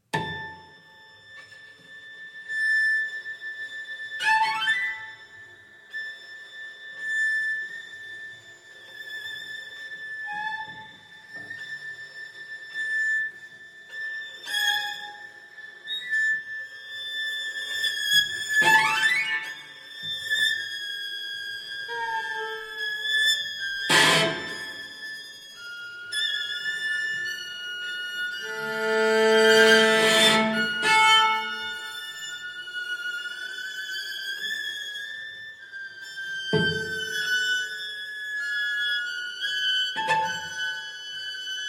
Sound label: string quartet; I. Claustrophobia